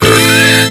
Car Horn; Hyperdrive Massacre; Horribles

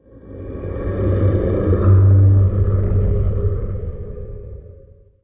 Ambient

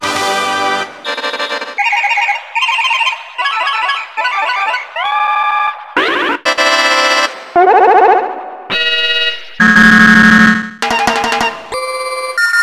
SFX Horns Honking; Mario Kart 8

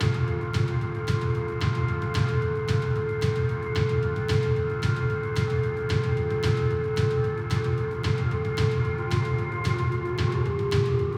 Danger Music; Radiant Crusade VR

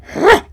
attack

